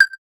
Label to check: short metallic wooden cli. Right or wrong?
Right